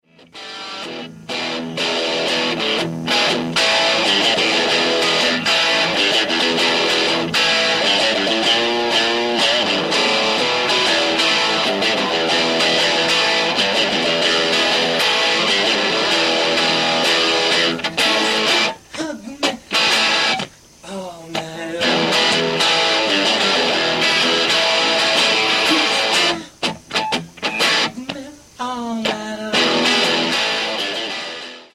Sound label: SOLO